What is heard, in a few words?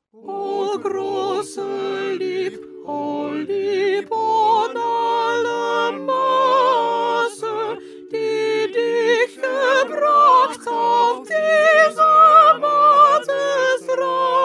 Soprano; Bach; St John Passion